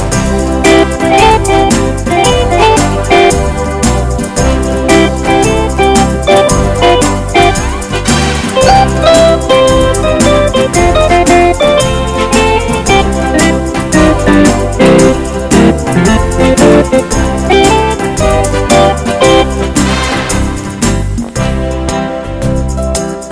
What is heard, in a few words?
Slow but Hot